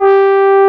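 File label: FM French horn G4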